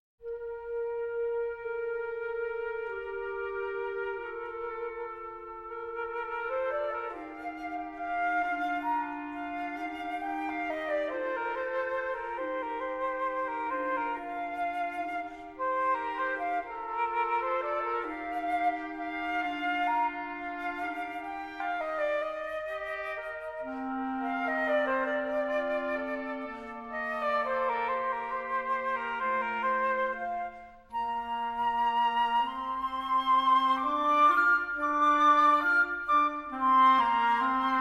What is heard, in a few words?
Flute, Oboe and Clarinet Piacevole; Divertimento; Op.37 VI.